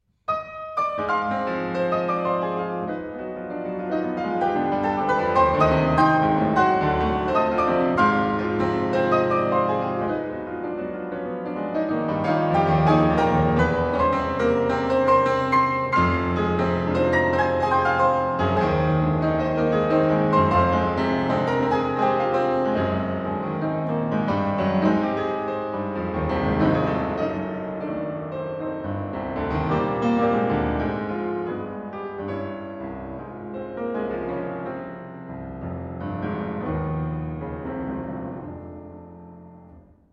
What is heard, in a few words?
skriabin prelude op11 c moll